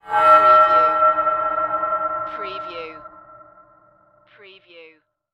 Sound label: Metallic